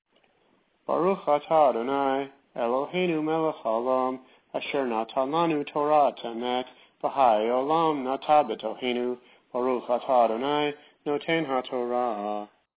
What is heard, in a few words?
Blessing after Torah reading